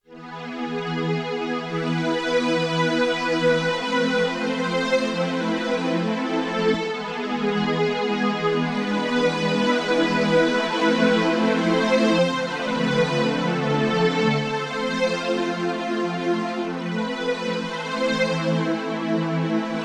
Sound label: Slow Attack Chorus, Violin Orchestra; Yamaha TX16W